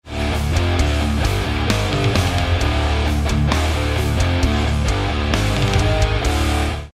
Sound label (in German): coole rock sms